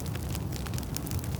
fire small; emitters global

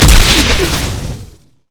pick a card red card; LoL; twistedfate

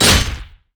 basicattack dragon; LoL; shyvana

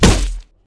yeti vs flesh; nunu

